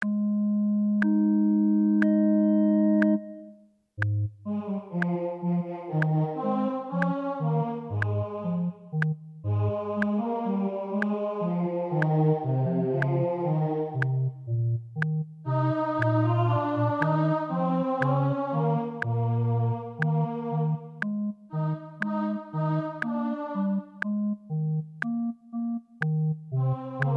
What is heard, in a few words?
O clap your hands; Gibbons